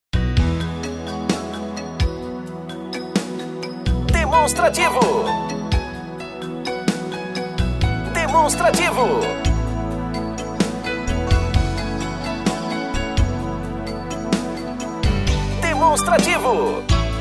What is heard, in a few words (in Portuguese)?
tema espera